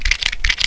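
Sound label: roll sticks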